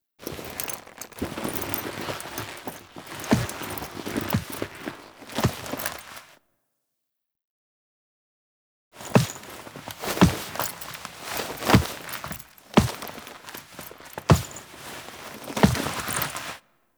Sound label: Death Foley; Warframe; Duviri; Cinematics; Teshin